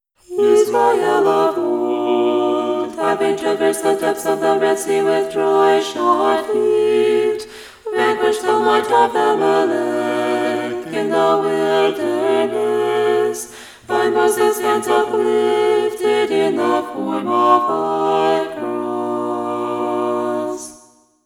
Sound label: Irmos; Choir